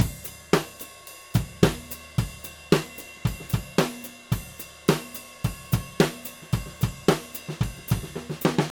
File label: Drums